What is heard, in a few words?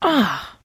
trader sigh; 商人杰恩（七日杀）